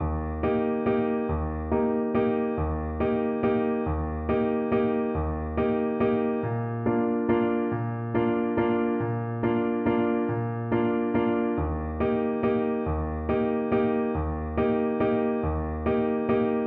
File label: horn, French Horn